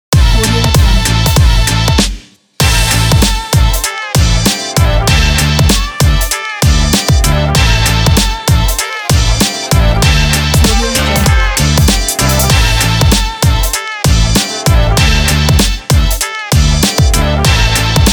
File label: the hiphop